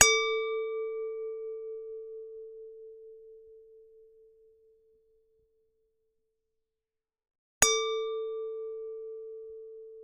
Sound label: Generic Click Glass Medium; boom library; The Interface